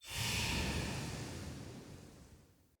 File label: UI Faction Choice Rollover